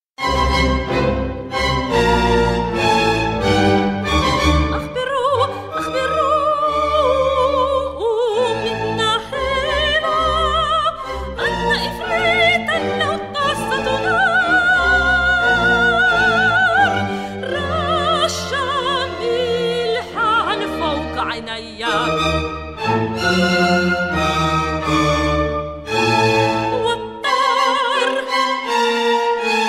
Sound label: soprano and symphony orchestra, sung in Arabic